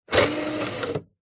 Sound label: household dvd player disc tray open